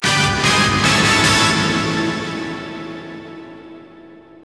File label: Str G#